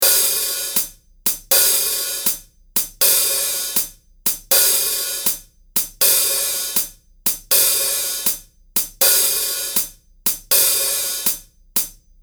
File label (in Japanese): Jazz; Jazz講座用DEMO